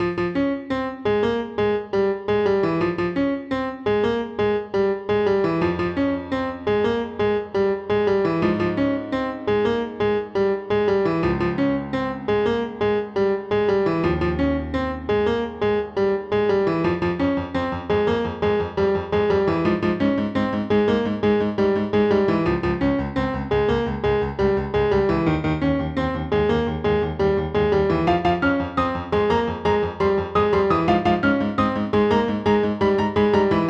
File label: piano